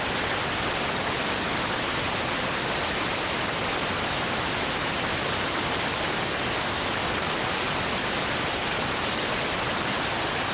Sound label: rtty rx